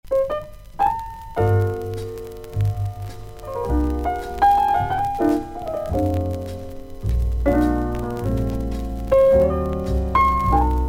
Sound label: piano, Piano Strings